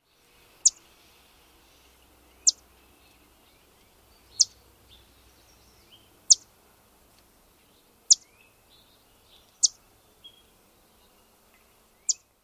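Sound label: American Redstart alarm chips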